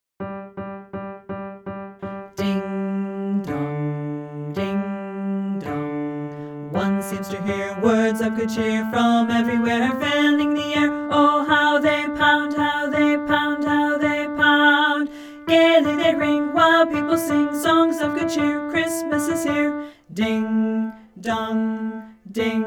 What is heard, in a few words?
vocal bass; carol of the bells